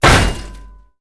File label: metal, zombie; mob; Minecraft; Votive World